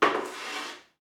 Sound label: slide tap